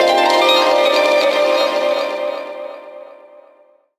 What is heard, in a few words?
HRP TRAP FX, Gbm; Rawcutz - Nu Forms